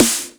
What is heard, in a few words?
Snares; osu